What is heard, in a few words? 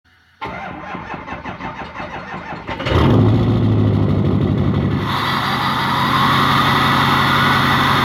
60 powerstroke